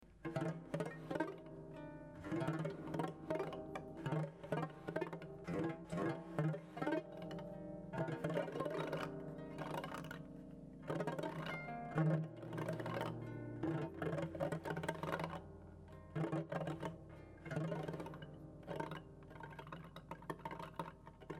echel, harpe

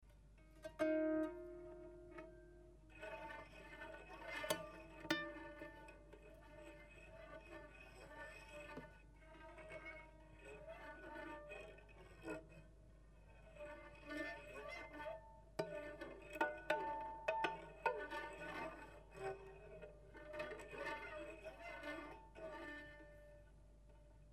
fils corde nylon, harpe

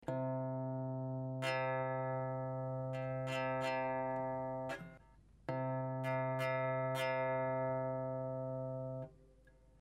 ongle, harpe

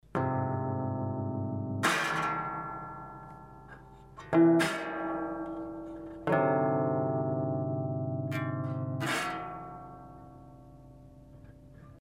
tige arret, touchers de la harpe

